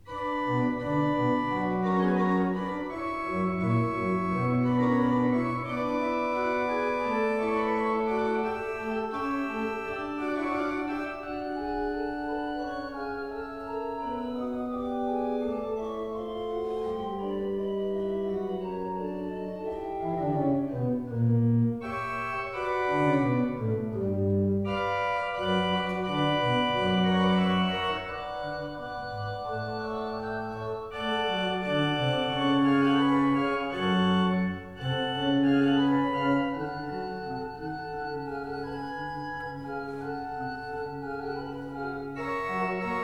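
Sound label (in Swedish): Preludium h-moll, orgel; Vogler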